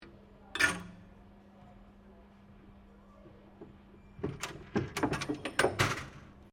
SLOT MACHINE MARATHON COIN MAZE OPERATING, Various old coin slot machines; Slot Machine Sound Effects